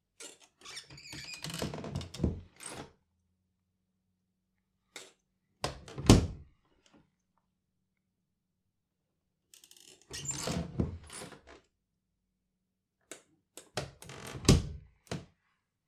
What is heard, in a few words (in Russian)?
mejkomnatnaia dver otkr zakr